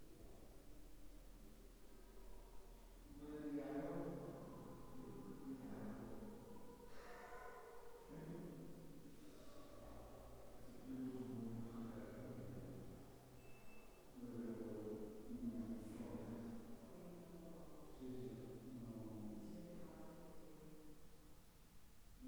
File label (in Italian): probabile canto lirico di sottofondo interposto alla voce degli operatori registrato nella